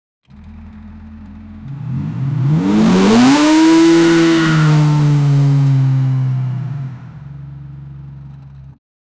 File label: motor v8 2 i4, intake